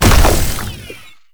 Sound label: Flying Mouth Proj Hit; Warframe; Man In The Wall